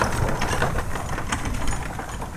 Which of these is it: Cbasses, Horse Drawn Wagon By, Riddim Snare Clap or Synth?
Horse Drawn Wagon By